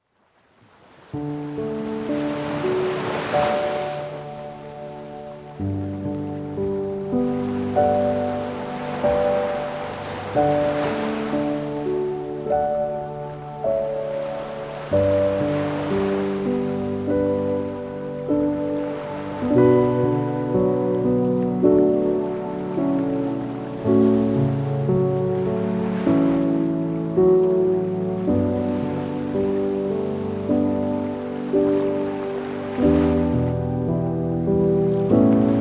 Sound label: relaxing ocean piano